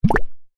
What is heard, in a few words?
bubble pop